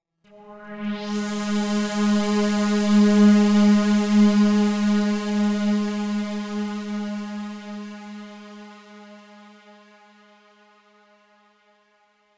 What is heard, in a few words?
Oneshot Synth